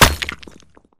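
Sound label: Bullet Light Ballistic Concrete impact; Titanfall 2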